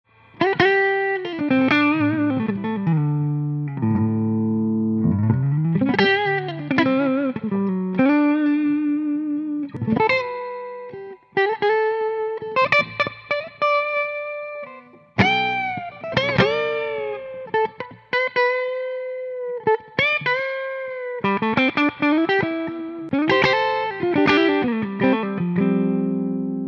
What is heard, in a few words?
Carvin Legacy Clean